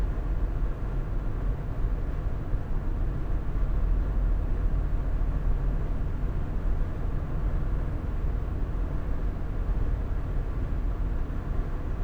Midsize Car 100 Kmh 2 cardiod mics, Background noise